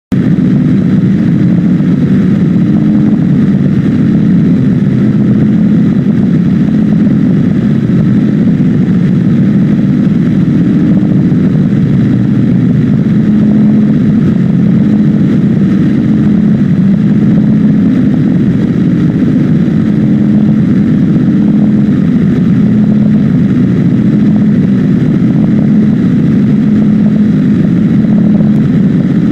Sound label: Morning Noise Broadcast